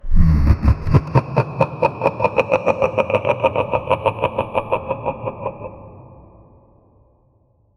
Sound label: giant creature laughter; undertones